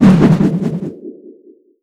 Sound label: whirling ranged cast; troll warlord